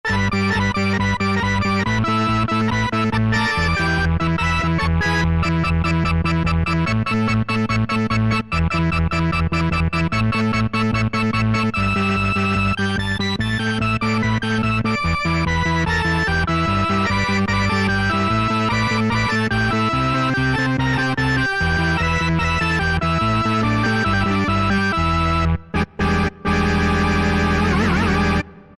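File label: Organ Far Fuzzier, Yamaha EX5R; Factory presets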